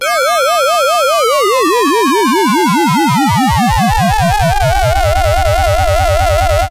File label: Osc vs Ring Mod, vintage oscillator; radiosonics